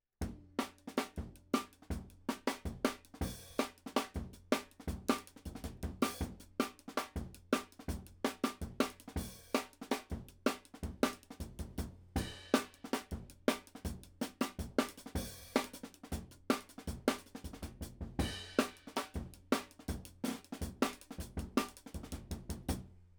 OH RIDE, drum n bass; JUNGLE BEATS; BLIND MAN RELAPSES INTO HARD BEATS TILL HE SEES THE LIGHT AGAIN; RAW MULTITRACKS

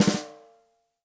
ROCK SNARE CHROMATIC, Real Drum Kits

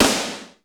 hard drums; 90 sSampleCDs; Crypton - DrumBase5000

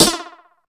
126 DRM, Disco House; USB Soundscan vol.20; Fresh Disco House I; AKAI; 126 BEAT

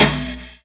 Loop, Chamberlin Rhythmate

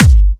Dubstep Kicks

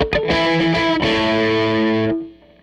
Strat Guitar Loop; Sonic Foundry (Sony Creative Software) - Six-String Orchestra

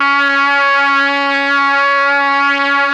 BRASS; ILIO; Propeller Island Legendary M400

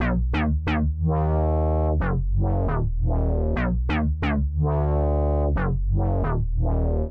Mean Riff B-Eb-Gb-D, 135bpm; Zero-G Sound Sense Grime Scene; GS Construction Kits; The Hoodrat; 90 s Sample CDs

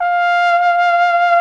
VIBRATO TROM

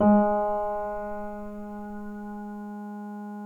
PNO PP; Synclavier Sampler Library

